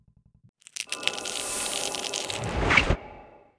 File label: shell sweep